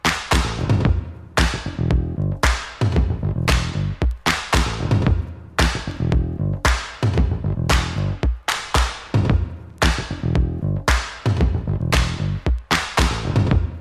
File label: 12 Inch Dance 80s Groove